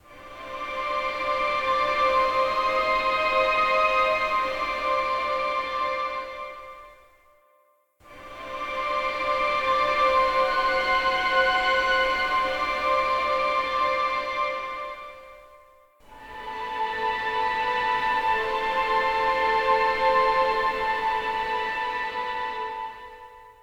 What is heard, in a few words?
Loop (Strings Stem); Grandpa's Note - Loop 3; PS4 PS5 Switch Windows Xbox One Xbox Series XS